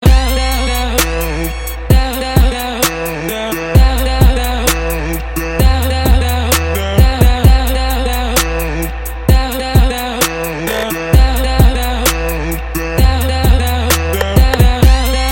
Dubstep Ringtone

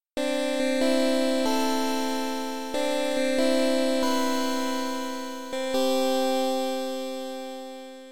elegant

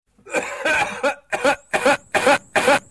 Funny Cough

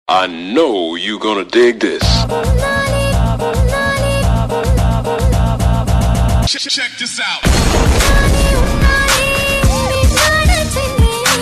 Remix Tone

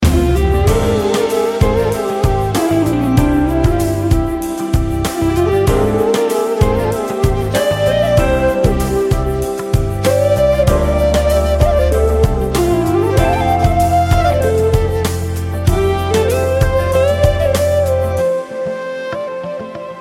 Sweet Fluit

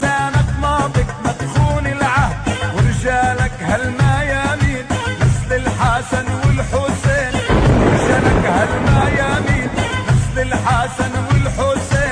Dabke; Hezbollah

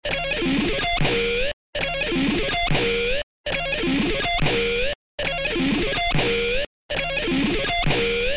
Text tone

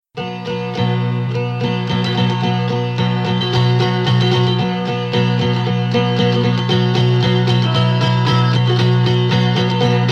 Dulcimer

